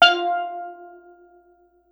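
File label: STEEL DRUM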